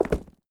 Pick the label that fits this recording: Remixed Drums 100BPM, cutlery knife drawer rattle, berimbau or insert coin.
insert coin